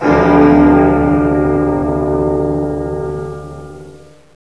bell; dm clocktower

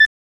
nuke ticking; assaultfire

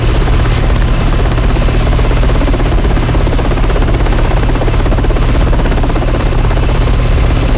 ap rotor; cs bunker goldeneye

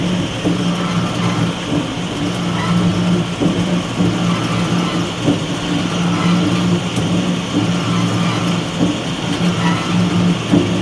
pump

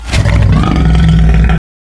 catacomb; lost world

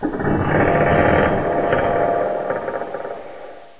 creak; dm borehole

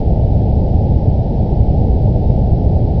engine; de abducted

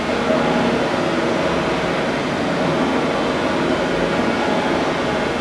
canal

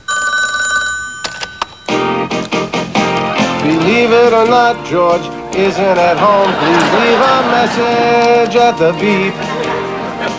ans phone; cs reloaded

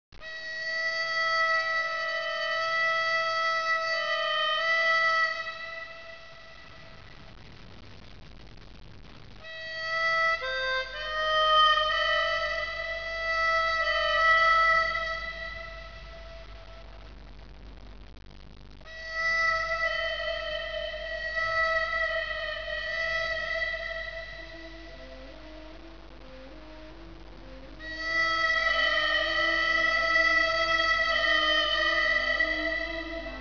harmonica

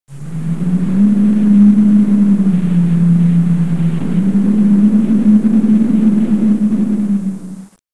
Temple wind; de torn

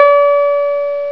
synthe

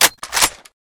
rifle clip out; weapons; deluge

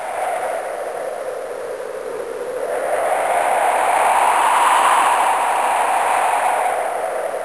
wind; texas

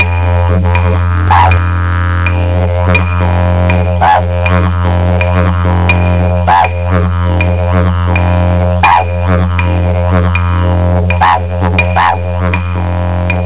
didge

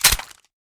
pick ammo

